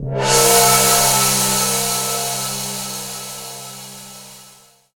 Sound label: Wash Gong; Zero-G Jungle Warfare 1, 2 & 3; Strings & Things